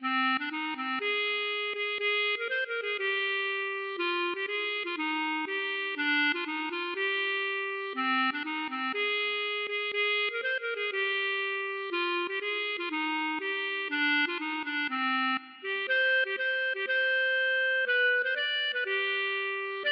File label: klezmer